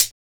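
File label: hi-hat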